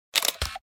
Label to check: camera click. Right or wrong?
Right